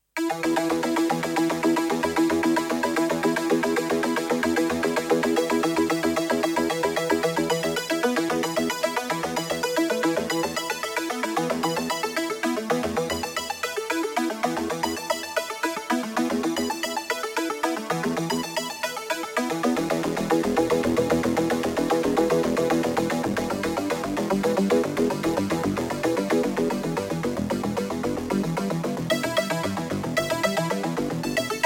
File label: Velo Arps